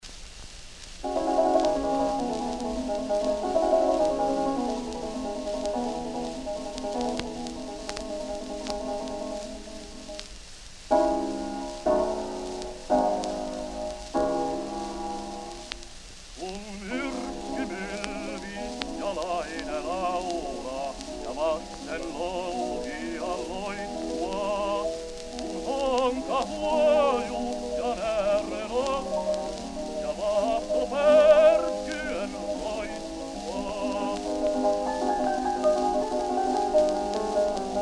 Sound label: Gramophone